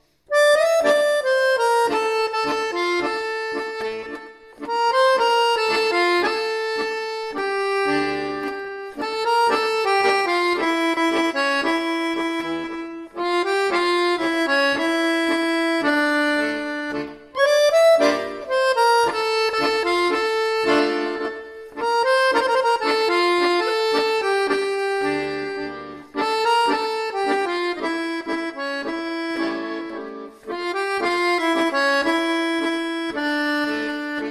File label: tango